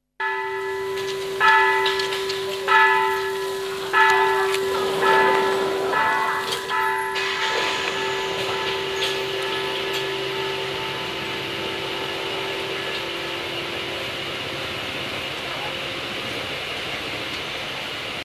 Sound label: tai bells short; south east asia street recordings